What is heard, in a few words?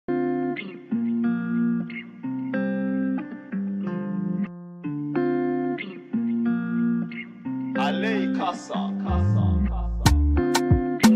instrumental